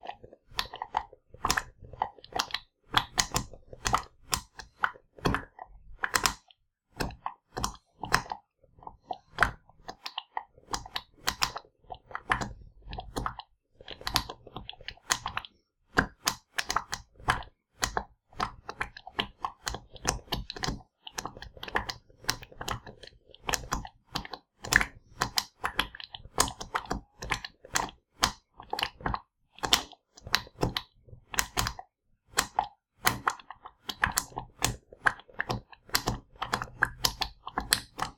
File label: Cornstarch Simmering